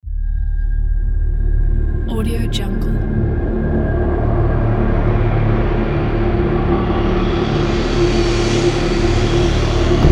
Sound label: dark suspense; alexandrfokshtein